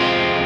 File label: solo 1 1 1 3 3 1 Fm